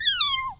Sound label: Cute Kitten Meow